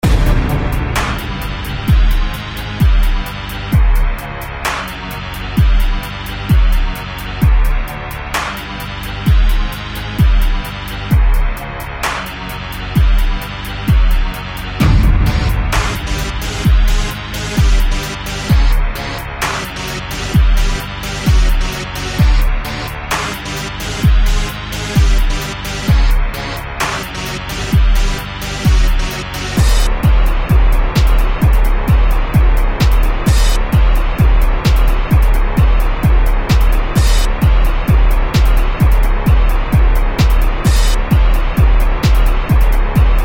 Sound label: King Boss (loop), Background Music and Loops; OpenBundle